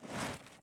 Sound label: prone asphalt; Escape from Tarkov